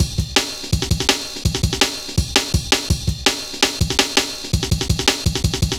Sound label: Rollin' Ride, Jungle Warfare, Drum Loops, 165.5; Zero-G Jungle Warfare 1, 2 & 3